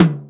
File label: R8-TOM; X-Static Goldmine 1